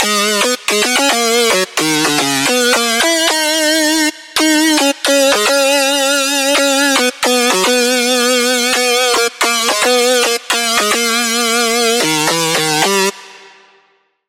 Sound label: Lead - Scream; Lead - Scream Of Sigrid; Routed Sound; Serum 2 Expansion - Hyper Gamer Vol.1